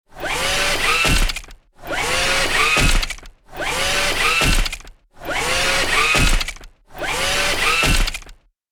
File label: Robot stealth unit footsteps walking; robotic